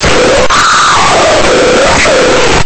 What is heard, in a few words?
kick